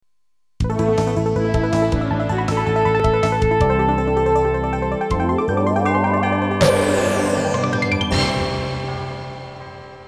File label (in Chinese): 电视包装音乐